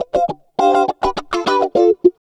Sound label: gte 105 f, guitars; house; Vocal House; PS-28 Vocal House Samples